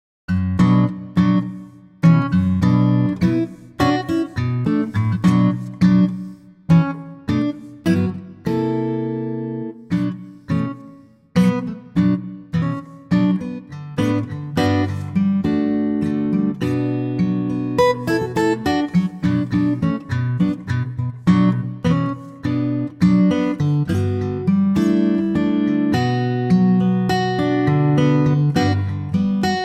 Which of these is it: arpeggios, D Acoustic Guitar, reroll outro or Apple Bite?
D Acoustic Guitar